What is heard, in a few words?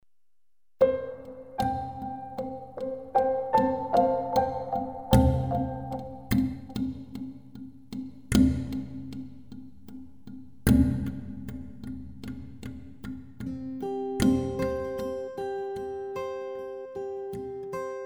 Mallets